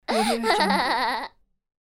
young girl laughing

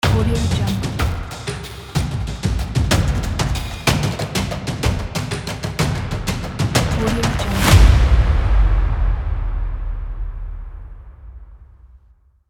percussion tribe ident

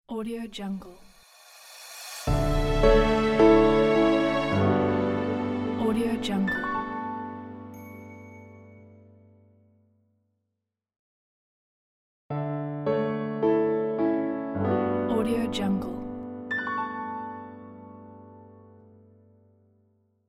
inspiring piano logo

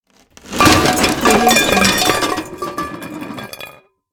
domestic stuff falling; sounddivision

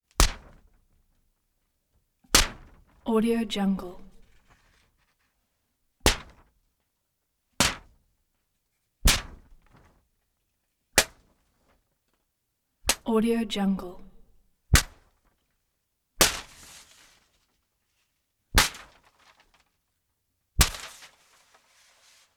paper hits large sheet doubled up taps stabbing

